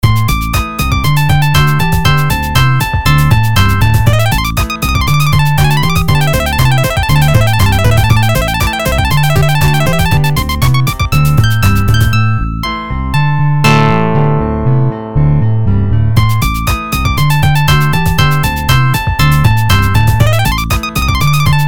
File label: Jingle Stuff